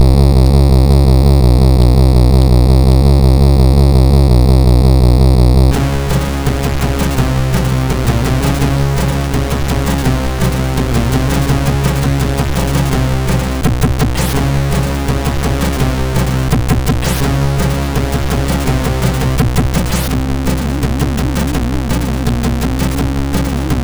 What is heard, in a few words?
Slow, sid MOS6581R4